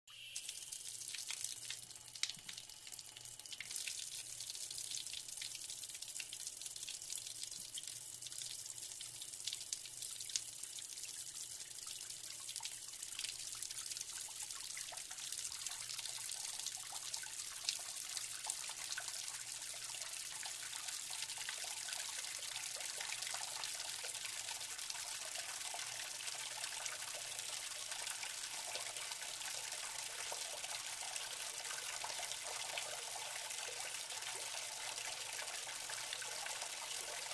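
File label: Sink Semi full Sink Water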